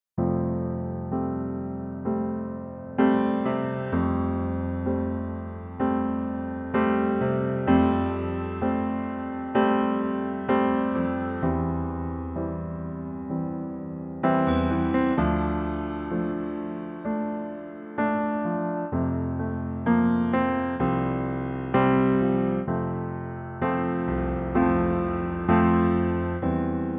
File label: F piano trak